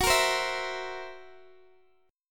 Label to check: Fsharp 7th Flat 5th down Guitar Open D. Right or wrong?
Right